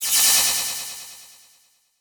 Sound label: Transition Laser Warble; Adobe; Production Element